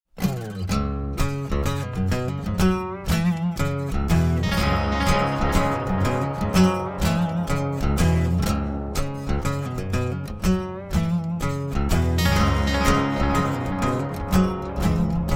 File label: Acoustic Version